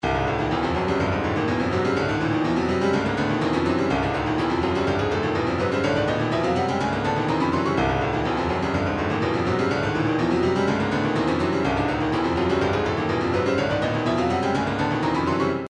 retro funk, midi; twistin

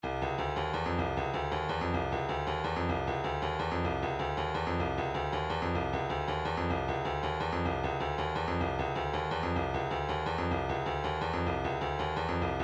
126 shaker, midi; East End

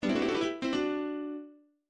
power up; nintendo stinger

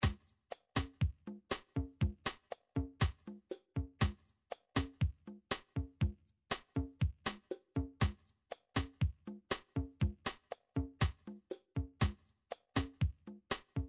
drum midi files bossa; Drum Midi Files Collection

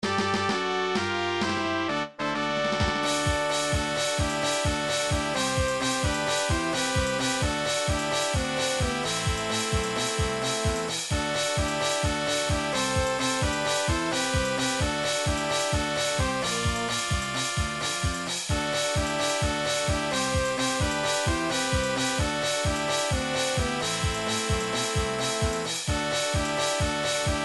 fanfare; snes final fantasy iii

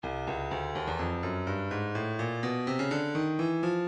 breakbeat 125 bpm; Pound For Pound C; Stylus Breakbeat Midi Files

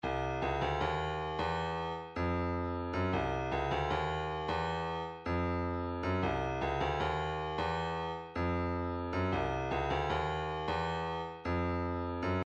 hihat

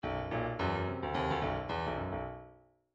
midi grooves funk

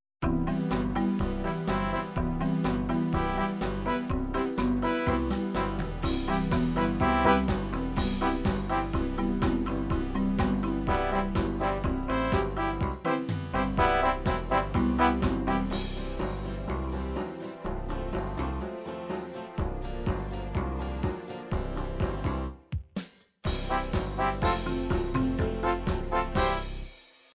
dance polka pop, midi

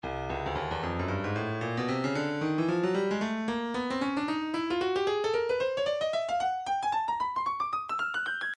breakbeat midi, 113 bpm; Moth A; Stylus Breakbeat Midi Files

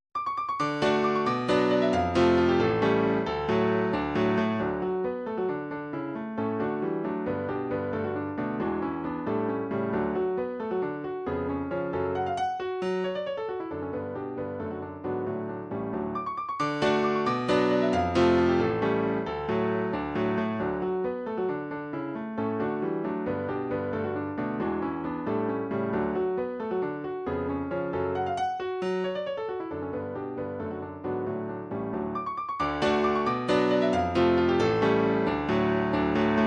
german quartet, midi

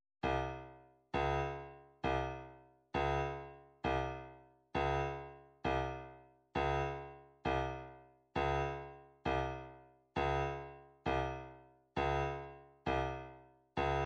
133 snare

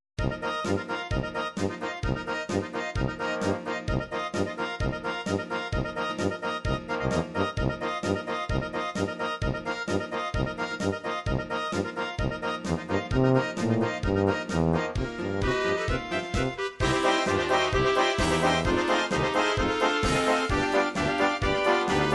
polka, midi

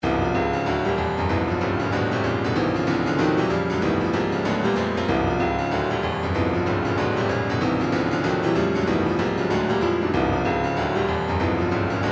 retro funk, midi; thang

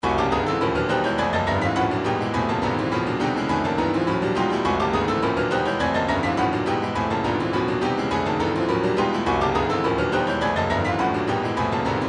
retro funk, midi; mortar

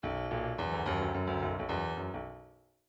bdf midi file grooves funk l funk l

